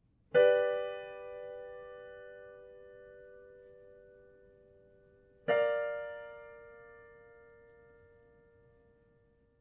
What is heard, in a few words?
Mediant mod up a minor third